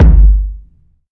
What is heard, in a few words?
large room steel kick drum sound g key